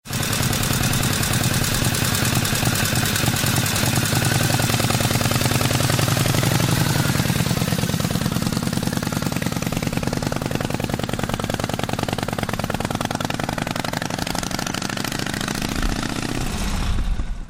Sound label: harley-davidson 1915